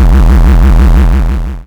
Bass, 8-Bit; Games And 8-Bit Kit Volume2; atomsplitteraudio; chiptune

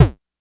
Kick Drum, Lo Fi Drum; Kicks

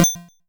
Blip, 8-Bit; Games And 8-Bit Kit Volume2; atomsplitteraudio; chiptune

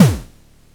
Kick Dirty, Lo Fi Drum; Kicks

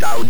down; chiptune; irrlicht

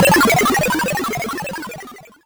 Zoom Down, 8-Bit; Games And 8-Bit Kit Volume2; atomsplitteraudio; chiptune

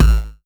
Kick, 8-Bit; Games And 8-Bit Kit Volume2; atomsplitteraudio; chiptune

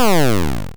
Zapper, 8-Bit; Games And 8-Bit Kit Volume2; atomsplitteraudio; chiptune

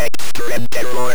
extreme terror; chiptune; irrlicht

